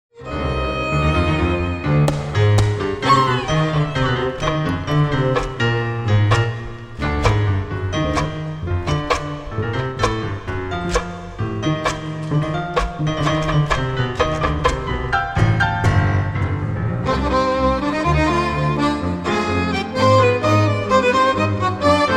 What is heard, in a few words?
Bass